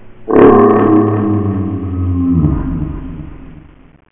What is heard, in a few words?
Big dog; Macworld (1998-02); From Macworld Readers